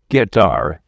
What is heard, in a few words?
guitar